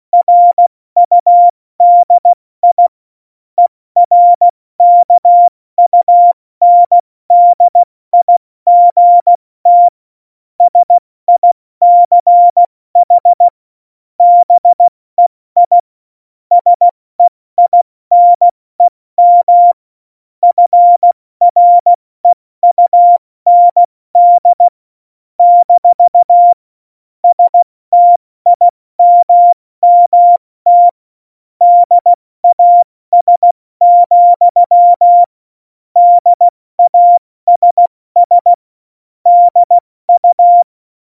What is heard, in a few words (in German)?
Witz, Morsekurs, 70 F80; Witze